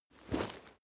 leather metal movement soft single

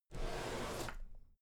drawer cabinet equipment open soft halfway

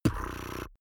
beatbox bass drum tongue roll soft

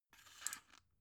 drug blister pull out soft

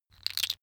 tiles wood

